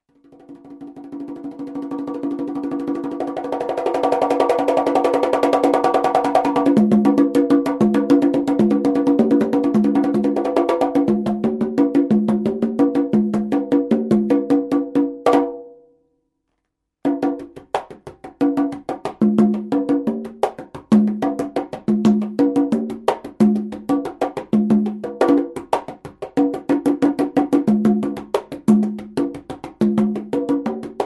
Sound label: cubano sound